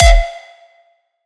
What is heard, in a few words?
raw kick